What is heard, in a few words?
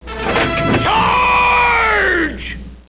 charge